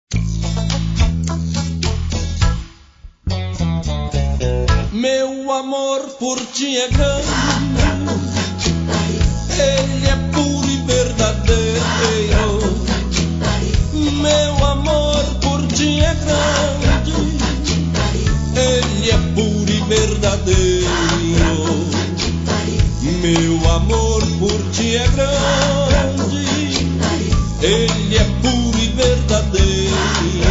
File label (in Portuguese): Zoeira Vinheta